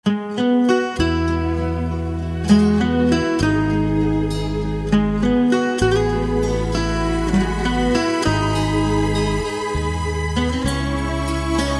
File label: Guitar; Veer Zaara